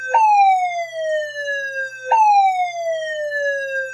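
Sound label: ambulance, siren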